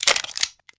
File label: 9mm clip, items; valve